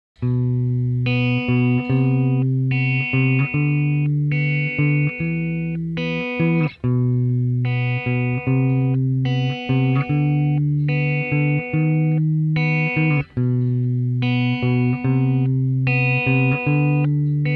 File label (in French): guitare